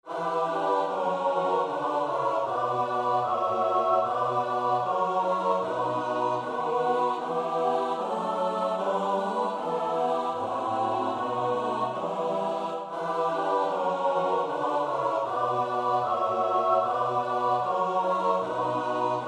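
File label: soprano alto tenor bass; Bach (Johann Sebastiaan); BWV 158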